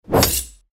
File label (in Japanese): 槍 金属音